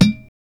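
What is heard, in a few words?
percussion; E-Lab; X Static Goldmine 1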